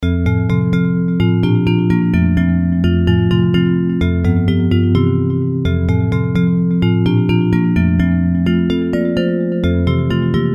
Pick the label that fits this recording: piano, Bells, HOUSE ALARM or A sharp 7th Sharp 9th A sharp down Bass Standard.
Bells